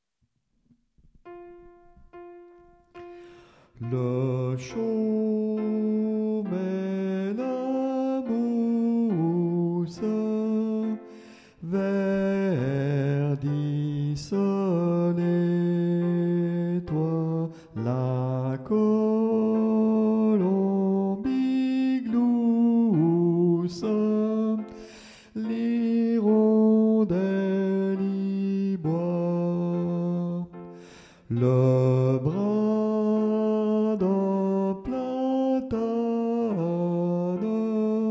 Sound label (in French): moulin sop, chants